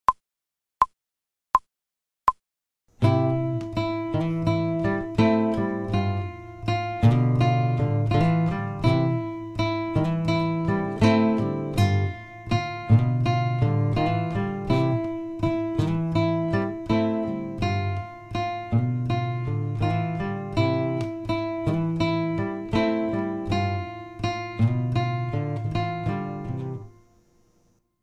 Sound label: slow, guitar; without metronome; Shakin